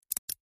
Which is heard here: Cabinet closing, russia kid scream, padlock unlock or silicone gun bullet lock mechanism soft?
padlock unlock